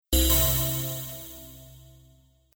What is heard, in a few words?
bells